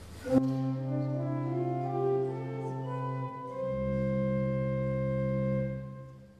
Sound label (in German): dur tonleiter